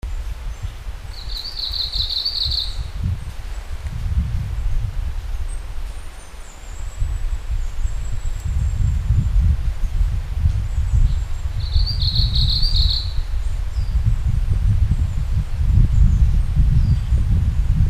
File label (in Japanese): 登山したときに標高1850メートルの針葉樹林で録音した「メボソムシクイ」の声です。; メボソムシクイのさえずりです。